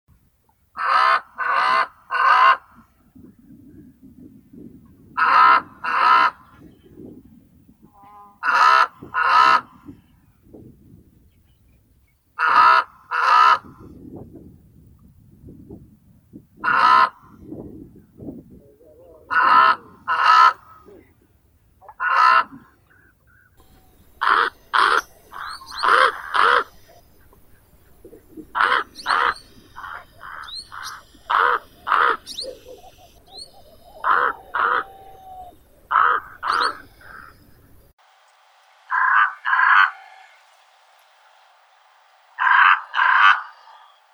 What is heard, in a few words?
voron pustinnii corvus ruficollis